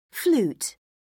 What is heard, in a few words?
flute; Oxford Word Skills Intermediate